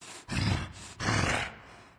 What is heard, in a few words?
lobby landing; smite; geb